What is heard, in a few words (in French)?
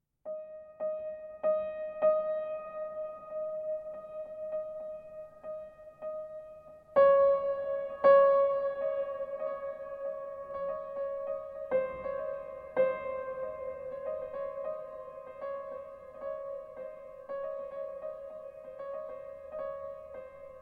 Piano Eau